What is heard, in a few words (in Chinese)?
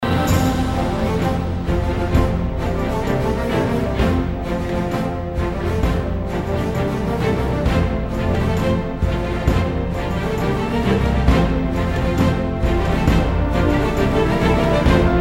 豪迈的背景音乐